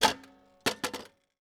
Old Metal Clock; 99Sounds; The Warehouse

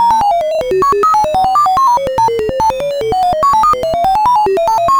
computer Noise; Kenney's Sound Pack; Sci-Fi Sounds

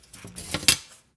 metal clang; 99Sounds; Sound Design Tools; Raw Foley

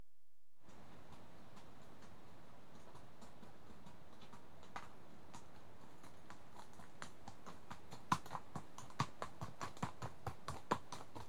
One horse walks past on rough tra; Horses; BBC Sound Effects Library

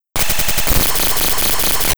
Rhythm

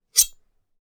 gore - large metal knife muted ring; Timothy McHugh; Gorification [HD]

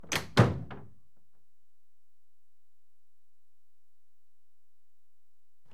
Carriage Doors Closed; BBC Sound Effects Library; Age Of Steam

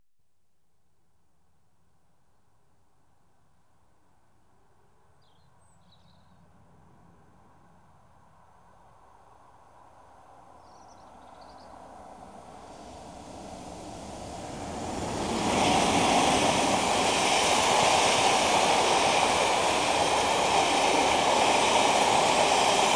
Trains Passing Diesel express; BBC Sound Effects Library

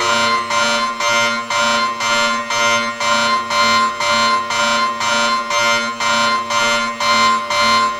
Alarm Fast, SCI-FI UI; David Dumais Audio

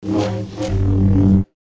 Saber; FXHome

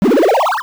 Power Up; fascinatedsound - Video Game SFX Mega Pack

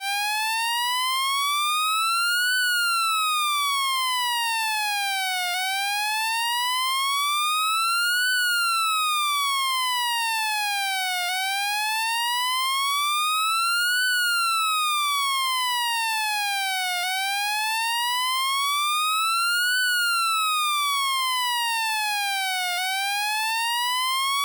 Alarms Siren High-Pitch Constant-Tone Low-Rate

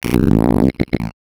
Vox, Circuitbend; 99Sounds; Mangling Audio

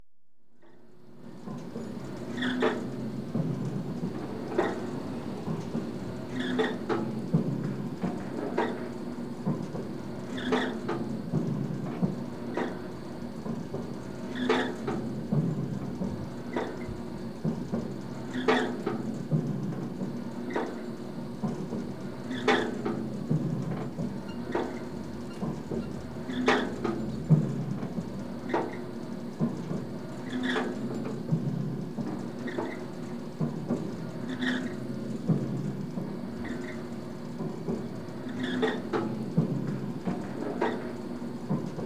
Oil Derrick, San Pedro, Californi; America; BBC Sound Effects Library

